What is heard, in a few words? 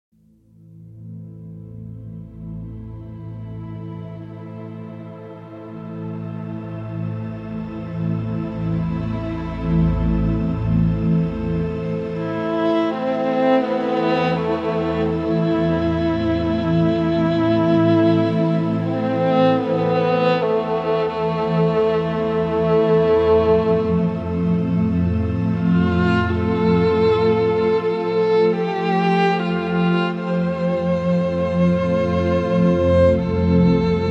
ambience peaceful